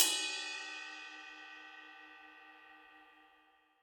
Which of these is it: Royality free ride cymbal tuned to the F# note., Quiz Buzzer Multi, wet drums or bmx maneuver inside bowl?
Royality free ride cymbal tuned to the F# note.